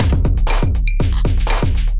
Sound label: Kit, Drums; Kindified Kits